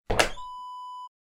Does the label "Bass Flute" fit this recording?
No